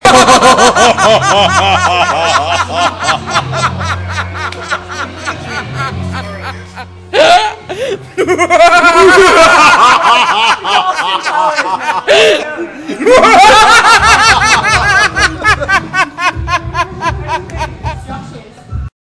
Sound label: laugh library